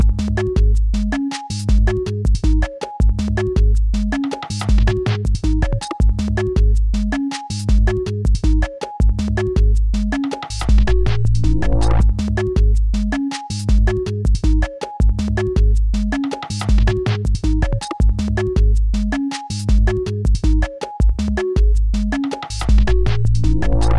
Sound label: loop; pseudOs